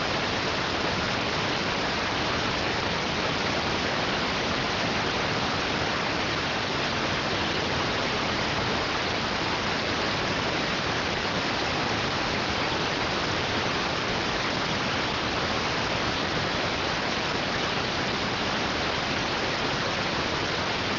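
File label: radioteletype receive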